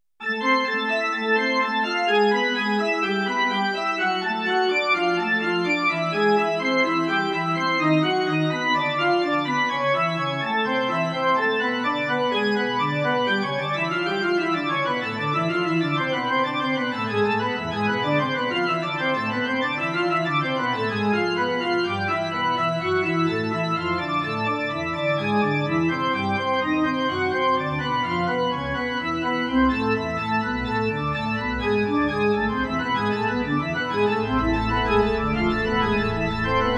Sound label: organ Bach, Organ: Bach pipe, Roland JV2080